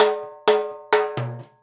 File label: FILL, DANZON, Percussion; InVision Interactive; Percussion Slam